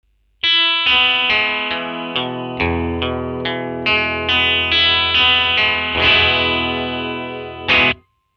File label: jag bridge, vintage and reissue jaguars; sound samples of vintage and reissue jaguars and jazzmasters